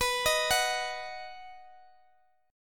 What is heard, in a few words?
B-Major B down Guitar Open D